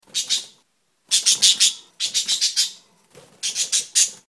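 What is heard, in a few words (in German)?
schimpfen, wellensittich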